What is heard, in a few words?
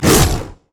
takedown attack; nidalee base